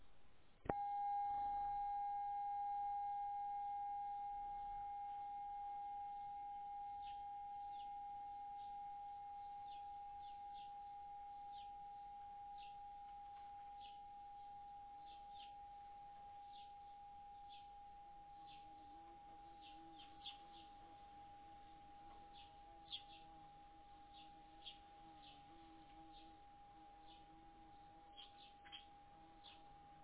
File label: bronze bowl, singing bowls